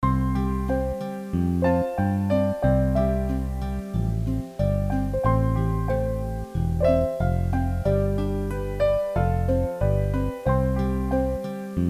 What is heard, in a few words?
Music Soft; Deine Reitschule